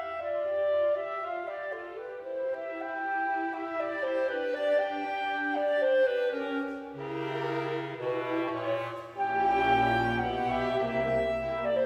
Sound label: Mozart concerto, English Chamber Orchestra; Brodsky; Anthony Pay